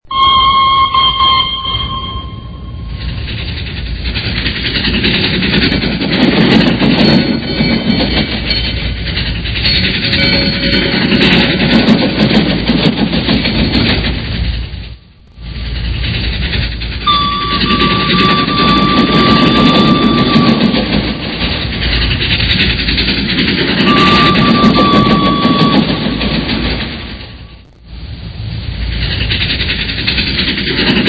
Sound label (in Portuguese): Trem; Vinhetas